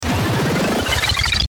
Power Up Accelerate Ascend Telemetry Flutter; Rise And Dive; Blastwave

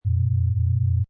Space Scifi Sound Spaceship Interior; Sci-fi ambience. Deep ambient rumble of distant reactors

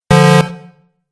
Truck Horn Quick Blast, Truck Sound Effects

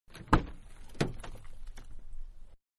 Small Car Door Open Exterior; Vehicles; Manhattan Production Music

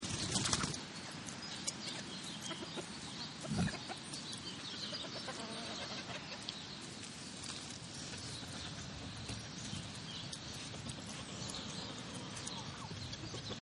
Farm Chicken Pig; Loop; Farms; Partners In Rhyme

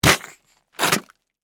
Stab Suction Mud; Texture; BlastWave

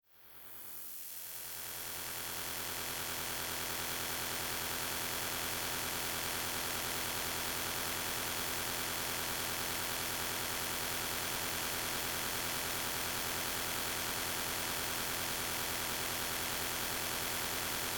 Scanning Machine SciFi Technology Static; Noise; Electronics

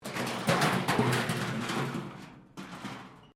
metal rubbish bin move concrete

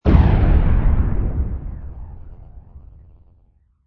Explosion Spacey, Phased, spaced explosion; Bombs; Sound Effects:Explosions

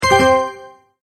multimedia tone, Goodbye

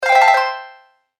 Prize Bright Game Sound; Video Game Sound Effects; electronic

